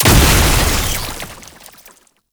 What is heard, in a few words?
Boss Stomp Web Explosion; Enemies; Abilities; Warframe; Oraxia